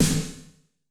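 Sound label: wet room snare 909, left; Masterbits - Soniq Elements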